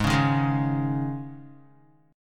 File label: G sharp Suspended 4th, Guitar; G#sus4; strummed; 3,4,3,1,1,x; 4F,4A,5C,5G,5C,6E